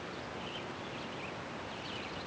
forest; Ambient; MX vs ATV Unleashed; XBOX

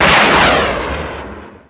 missile launch; Chase Hollywood Stunt Driver; XBOX

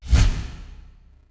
whoosh

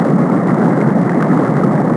Cave Current; Finding Nemo; XBOX

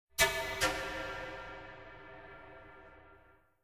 MUSIC pipe; Myst III Exile

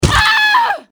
die; Soldier Of Fortune II Double Helix; XBOX

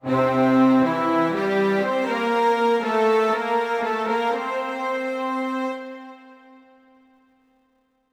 music gauntlet; TyTheTasmanianTiger3; XBOX

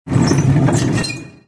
cart roll; movers objects

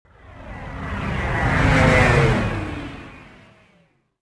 traffic by; movers objects

